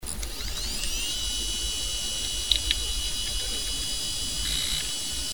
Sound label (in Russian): Zvuki izdavaemye polnostyu ispravnym vinchesterom QUANTUM CX